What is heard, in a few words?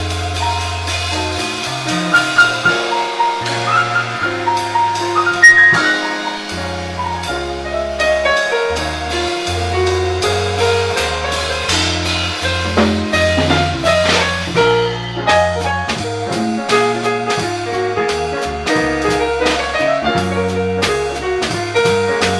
Fender P Bass